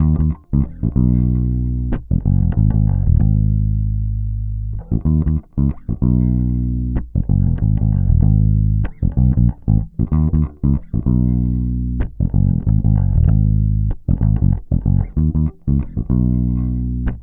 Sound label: Bass loop 95 Abm, Electric Bass Loops Direct; Slate Digital BASSHOLE Sample Pack